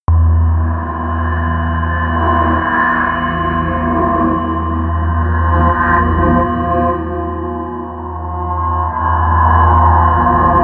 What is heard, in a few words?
drone sonar